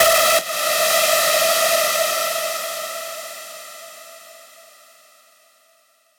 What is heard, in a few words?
synth screech oneshot E; GhostHack